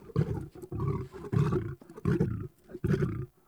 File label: Wolf Growl Run; Animals